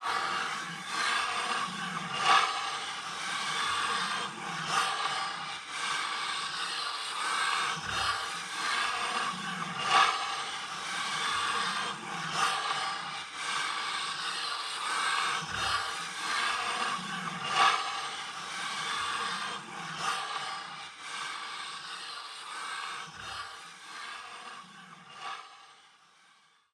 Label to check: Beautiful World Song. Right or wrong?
Wrong